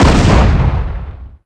explo; Pacificwar

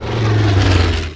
airplane select; Pacificwar

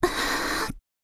Breathing

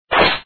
Attack

